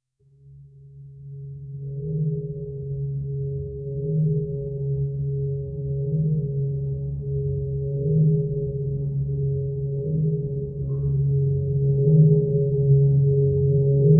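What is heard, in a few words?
a low continuous anxious m, hospital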